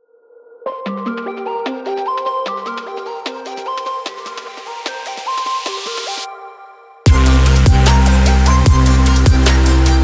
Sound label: adrenaline rush dubstep